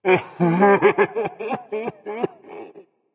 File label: boohoo berserker; cuchulainn